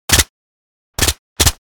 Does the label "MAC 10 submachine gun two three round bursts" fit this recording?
Yes